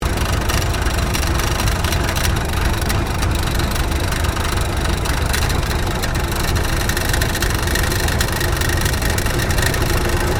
AIRCRAFT T-28D TROJAN TAXI SHUTDOWN INTERIOR; Airplanes (Sound Effects:Vehicles)

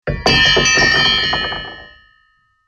Metal Crash Tumbling Debris; Metallic Thuds; Materials; BBFX

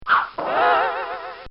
Whip And Bong

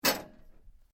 metal rods hit; Metal (Sound Effects:Materials)

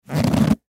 Rubber Stretch, Rubber Stress Stretch Rub; Rubber Thick Stress Stretch Rub; Miscellaneous Office; Blastwave; Foley

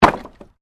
Wood Movement Medium

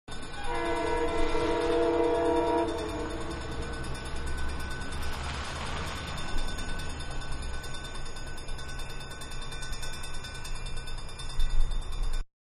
Railroad Crossing, Train-Crossing; Trains; Vehicles; Manhattan Production Music

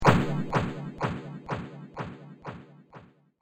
Atomic Laser Echo; star wars / trek style games; Andrew Fleming

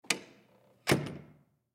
Hallway Door Close; Miscellaneous Doors; Office; Blastwave

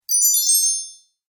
Magical Turning Spell

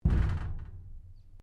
Strike Metal Tank Exterior Rattle Soft; Material components to create more complex sounds

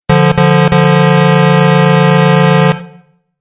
Truck Horn Three Blasts; Truck Sound Effects

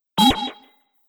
Droid Unhelpful Bleep; Robot Sound Effects; Sci-Fi Robot

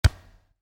Volleyball (Exterior): Hit Medium; Miscellaneous Sports; Stuart Duffield

